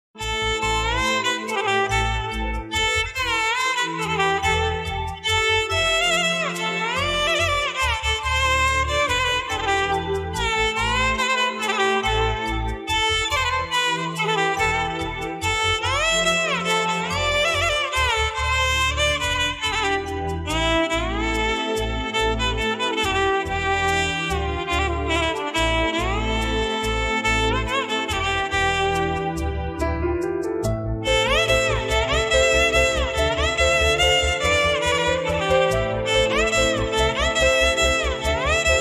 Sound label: movie amma bgm ringtone mom bgm; raghuvaran btech; amma love bgm